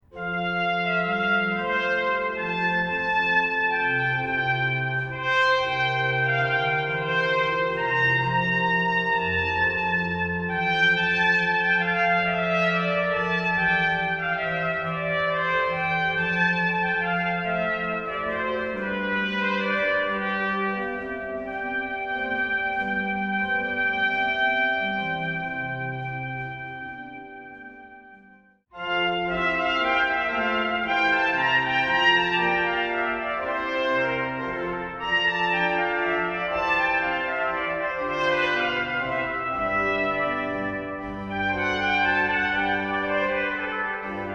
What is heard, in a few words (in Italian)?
Siciliana Tempo di minuetto; Vodička